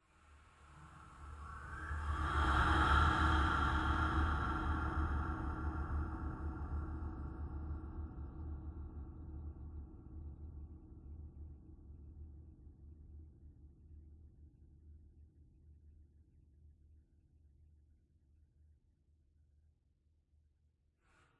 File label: Air Vocal; horror sounds; Hollywood Edge